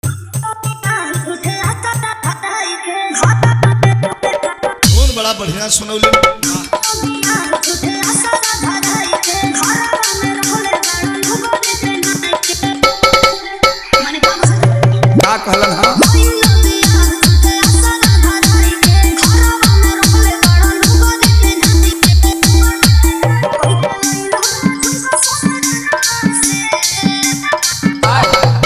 Hard Bass Mix

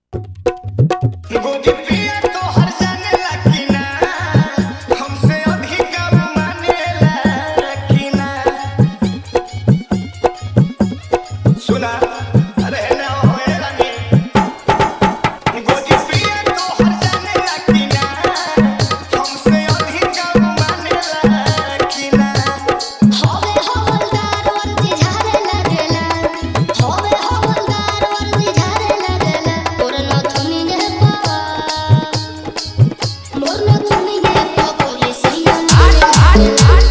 Dj Song Jhan Jhan Bass Mix

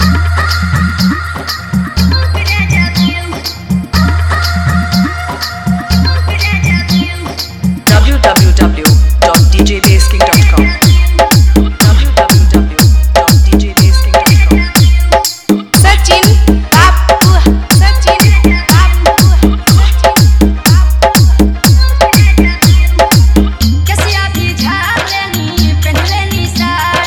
Hard Vibration Bass Mixx

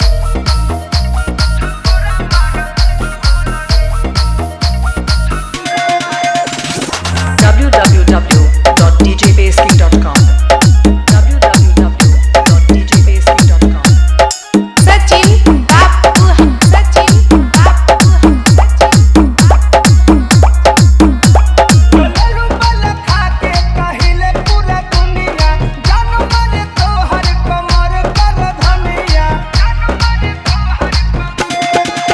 Hard Vibration Mix

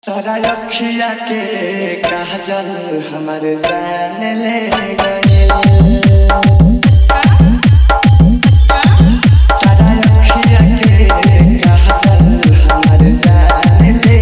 Hard Bass Mix Bhojpuri Dj Remix Song

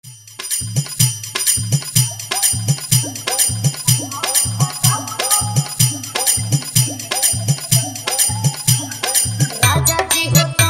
Bhojpuri Dj Remix Songs

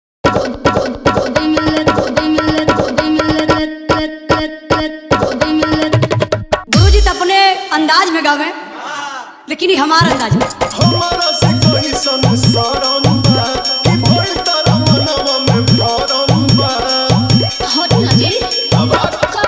New Bhojpuri Hard Bass Dj Remix Songs

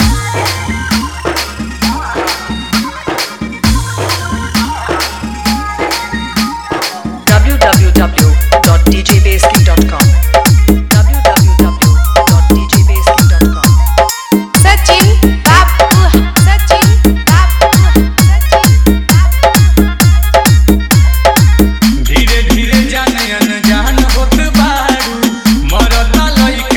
Hard Vibration Mix

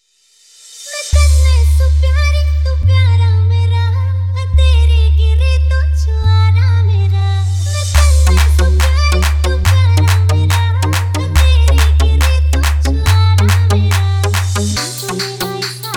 Haryanvi Dj Remix Songs Vibration Bass Mix

